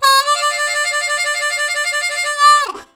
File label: Long roll, Harmonica Riffs; Zero G - Harmonica